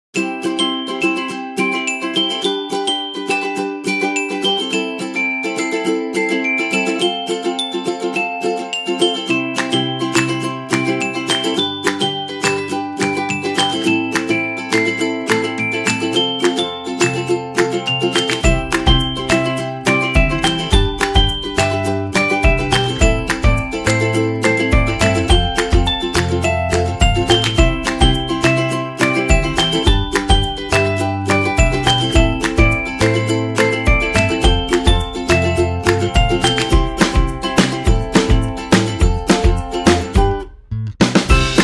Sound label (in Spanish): Música divertida y alegre para Niños